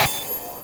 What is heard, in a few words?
FX Metallic Charred; FX Hit; Drums; Ableton Live 12 Lite; Core Library